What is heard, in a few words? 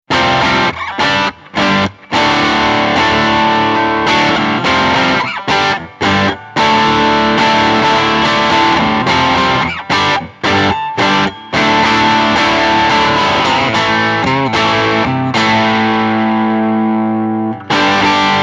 Tone Master FR 10 Tweed; Fender